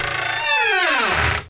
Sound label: door open